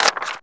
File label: STAMP CLOSE; maimai